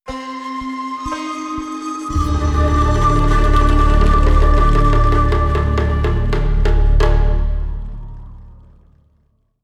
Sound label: Round Start; Zombie Island